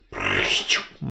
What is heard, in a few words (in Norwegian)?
sjefen prtsjtsj